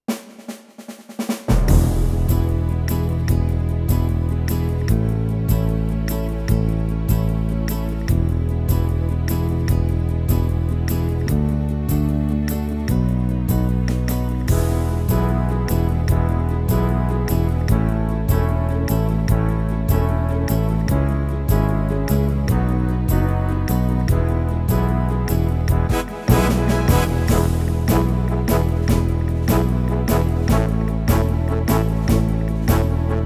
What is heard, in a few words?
style movie and show wild west, yamaha tyros3